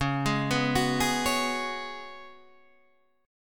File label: C sharp 7th Suspended 4th C sharp; C#7sus4; arpeggiated